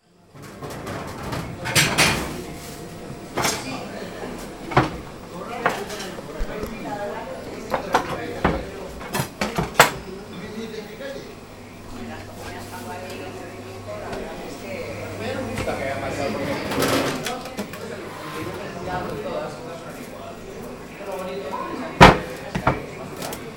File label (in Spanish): ruido cocina; bilbao; fermin; soundfood